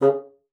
bassoon, C#3; Xsample VOL-6

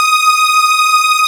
PAD WARM, Nord; Wizoo - Powered Nord; LEAD PAD HRN

